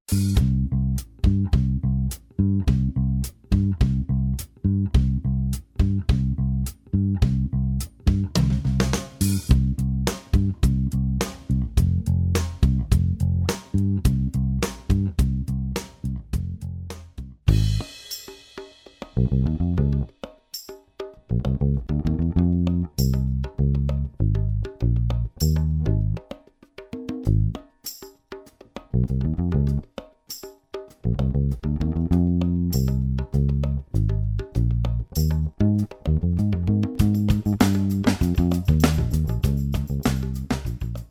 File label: Motown Disco Dance, drum tracks